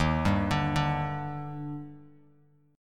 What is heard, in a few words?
Eb Major 7th, Bass Standard; EbM7; arpeggiated; JGuitar